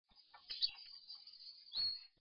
tropical birds fade out

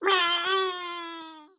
baby crying

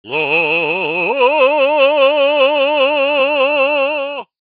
opera

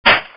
Chain

